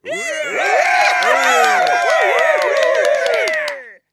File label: small group applause